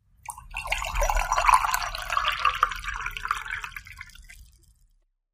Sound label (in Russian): Жидкость наливают в стакан